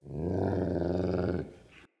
Wolf Growl Enemy Spotted; Animals; Perception

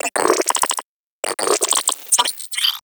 Computer Beeps; Guild; Warframe; Misc Remaster

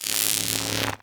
Arc Rifle Fire Random; Infested

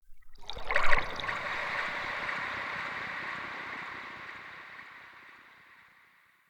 trippy lap, ambience; Soul Plane

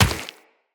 Run Rock, Footsteps; Characters; Tenno

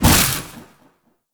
Shield Stagger; Warframe; Warframes; Garuda

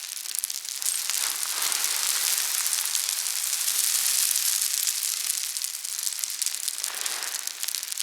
Settlement Falling Sand Loop; Warframe; Grineer Settlement

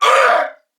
Pain Corpus Venus Male, en; Warframe; CorpusVenusMaleB

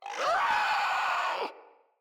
Infested Crawler Attack Short, Enemies; Crawlers

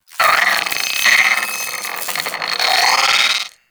Sentient Brachiolyst Idle; Warframe

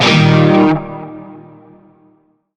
Shawzin Pent Min Chord H; Warframe; Narmer

